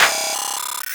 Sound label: pitch up; kidGlitch; Ableton Foundation FreePacks; Wicked Kits